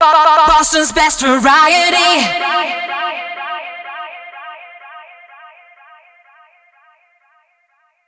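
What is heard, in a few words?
125bpm f MIX, jingle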